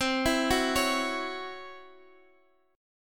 Db Minor Major 7th Double Flat 5th C

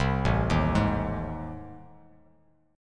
A Minor Major 7th Flat 5th, Bass Standard; arpeggiated; AmM7b5; JGuitar

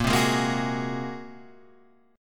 Bb 7th Suspended 2nd Sharp 5th Bb; Bb7sus2#5; strummed; x,x,8,5,8,5,8,5; JGuitar

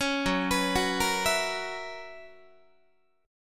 Gb 11th, Guitar

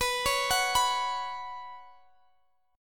B Suspended 2nd, Guitar, Open D

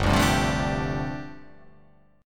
G 7th Suspended 2nd Suspended 4th

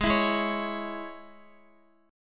Ab Suspended 4th Sharp 5th Guitar, chord; Absus4#5 strummed; x,x,6,9,8,8; JGuitar

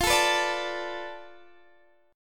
F 6th Flat 5th F down Guitar Open D, chord

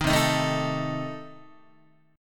C Minor 6th Guitar, chord; Cm6 strummed; JGuitar

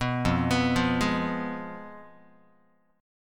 F Diminished Guitar; Fdim arpeggiated; JGuitar

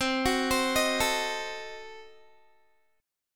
C Diminished 7th, Guitar; arpeggiated; Cdim7; 4E,4A,5D,5G,5C,6F; 20,21,22,20,21,x

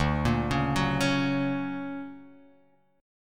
D Augmented Major 7th down, guitar

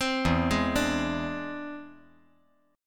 E Augmented 7th E down

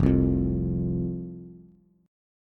F 5th, Bass, F5 Chord; strummed

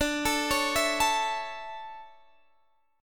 D Major 7th Suspended 2nd, Guitar Half Step Down

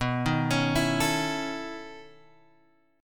B 11th B, chord; B11 Chord {7 6 X 6 5 5} arpeggiated

